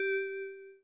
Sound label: Christmas Minimize